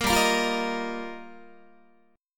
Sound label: G Minor 7th Sharp 5th, Guitar; Gm7#5; strummed; JGuitar